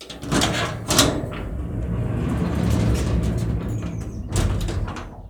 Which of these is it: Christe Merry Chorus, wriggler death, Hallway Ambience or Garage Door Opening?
Garage Door Opening